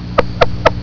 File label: plains leopard frog call; texas nature trackers; amphibian watch